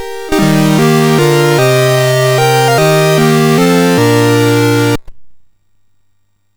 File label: sid MOS6581R4; Passing Shot